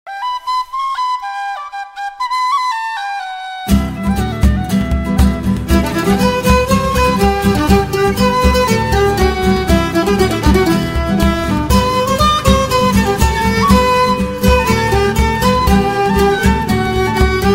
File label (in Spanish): GALES música folklorica